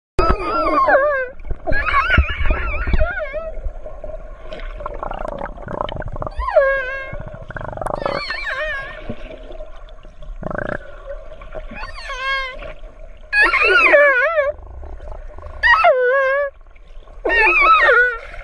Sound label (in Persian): صدای نهنگ قاتل; اورکا